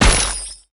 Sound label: Snow Man Damage; xmas